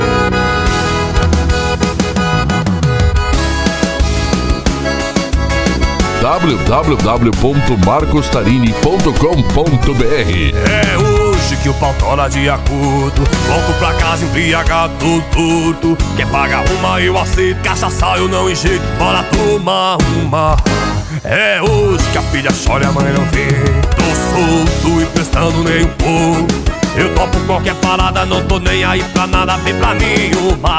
sertanejo; balada g4

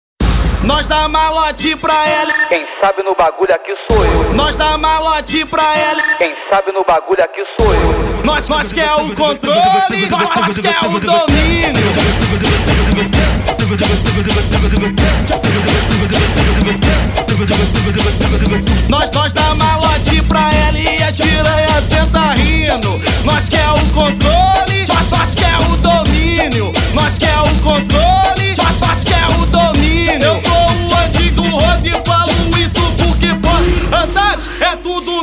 funk; equipe aloprados som altomotivo

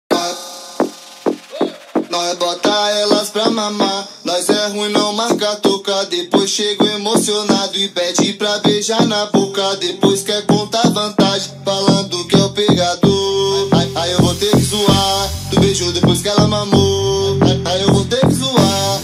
mega funk; bocat

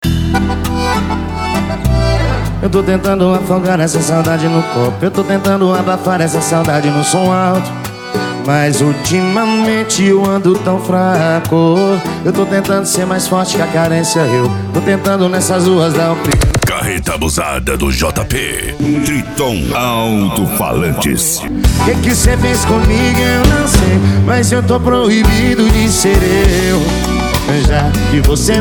sertanejo

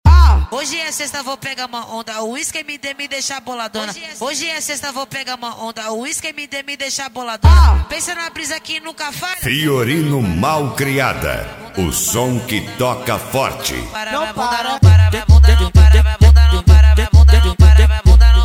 mega funk bass

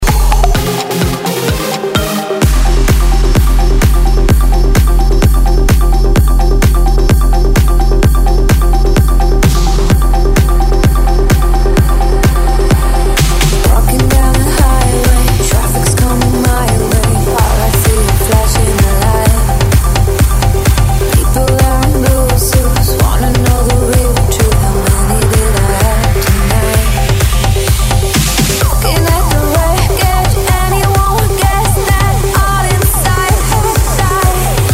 electro house

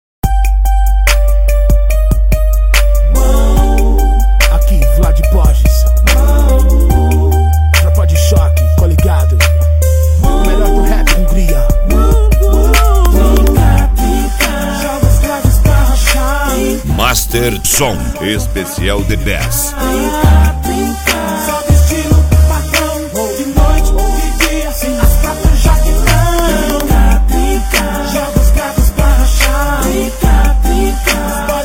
bass

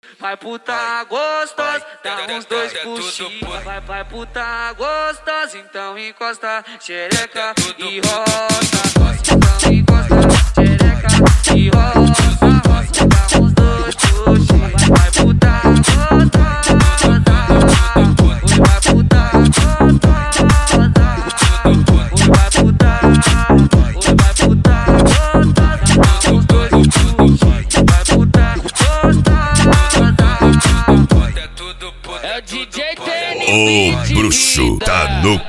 beat; cd equipe los diablos 2

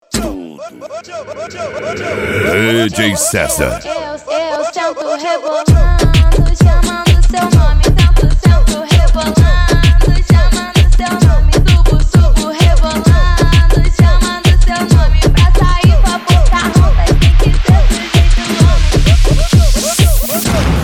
retro

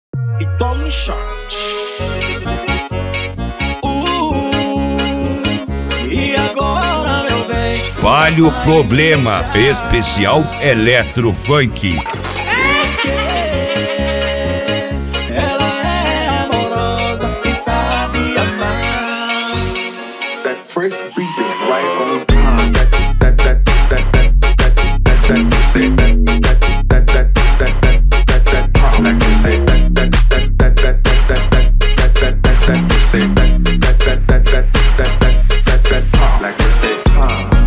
eletro funk

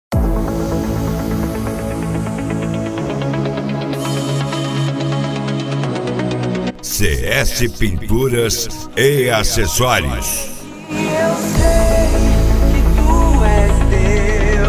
remix gospel

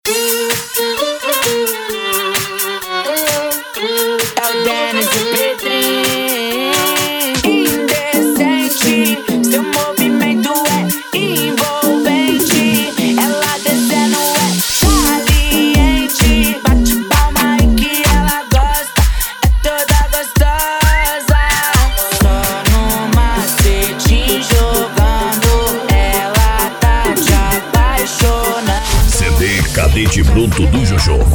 electro funk

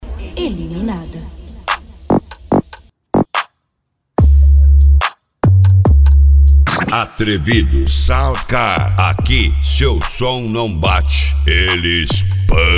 funk mega bass

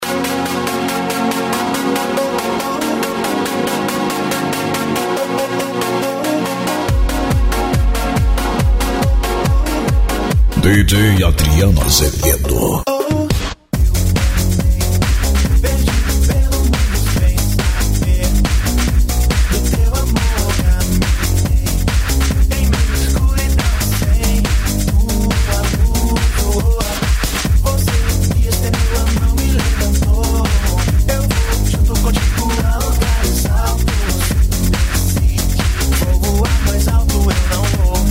gospel dance

